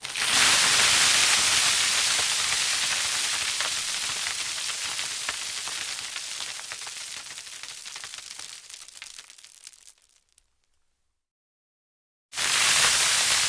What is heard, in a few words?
pebbles drop dense mono; sonniss gdc